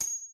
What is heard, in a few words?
50 cal Hit; Red Hot Vengeance